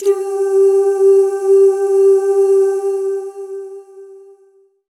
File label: Jazz Doos Long; Roland BK-5; Loops & Phrases